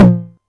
Tom Hi, Novation Drumstation; DrumstationV1

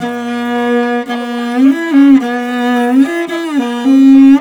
VIOLI LP, MORIN.VLS, Asian Traditions; Soundscan vol.60; AKAI

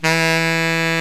SAX COMBO

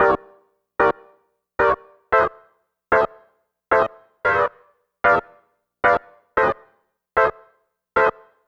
Synth Pattern; Electrocution

